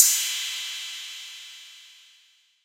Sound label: Cymbal TR-808 Drive; 808 Day